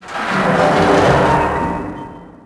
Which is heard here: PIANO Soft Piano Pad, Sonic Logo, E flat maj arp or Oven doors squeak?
Oven doors squeak